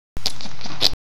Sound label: grunt